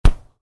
Soccer Ball: Header The Ball; Football; Sports; Stuart Duffield

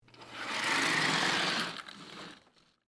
Shade Close Door, Household, Wall; Window

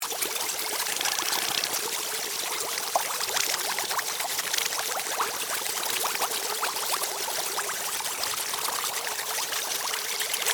Laboratory, Ambience, Hospital, Laboratory; Hospitals; Backgrounds; BlastWave

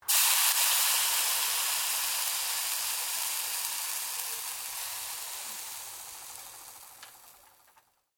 Water Sizzling Hot Pan; Heated water sounds; Kitchen; Household

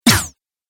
Robot Strike Attack; Robot Sound Effects

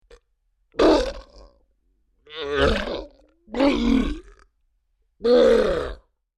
Creature Large Grunts Pain; Monsters; Sound Effects:Horror; Big Room Sound

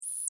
Insect Voice Scream, Vocalization, Bug; Animal

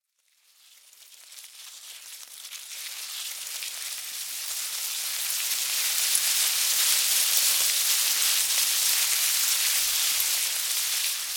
Ants, Army, Bugs, Movement, Colony, Herd, Insects; Approach, Leave; Ant Sound Effects; Ants (Sound Effects:Animals:Insects); Blastwave

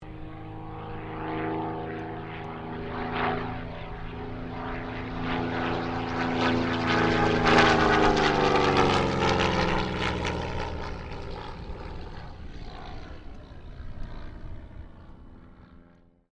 AIRCRAFT CESSNA 195 PASS; Airplanes

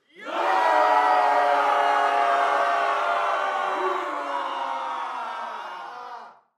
Human Crowd Studio; Crowd Reactions Barbarian Cry Long

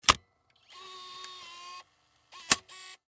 Dv Camcorder Deck Open; Cameras; Electronics

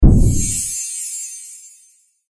Magical Impact Dungeon Adventure; fantasy sound effect; role playing games, magic videogames

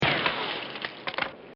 Machine Gun; Military

